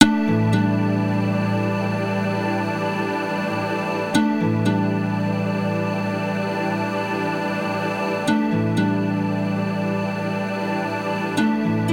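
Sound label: RND Portico 535 80 Harp Vox Blend 100 Thr 18 Gain 20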